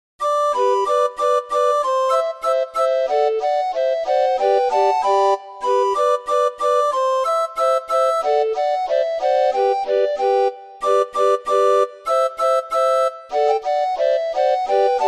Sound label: Little brown Jug, orgue barbarie